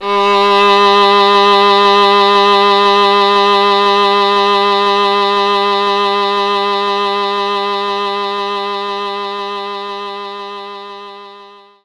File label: Violin A3; knights try